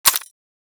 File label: Rifle Mech Secondary; Warframe; Tenno; Tenno Bard Rifle